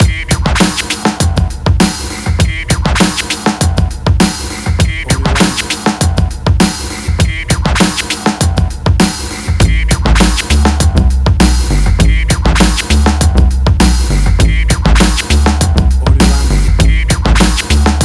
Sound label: 90s Hip Hop; Hooper Avenue